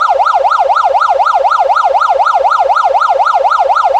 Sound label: Police Siren